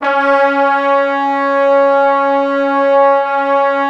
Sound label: C#3, Orchestral Brass; Best Service ProSamples vol.20; AKAI